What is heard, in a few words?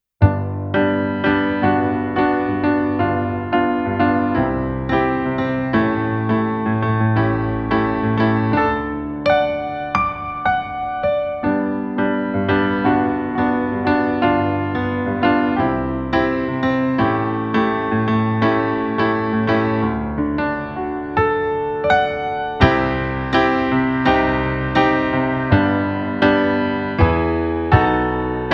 Instrumental; intended to be used as a backing track for your performance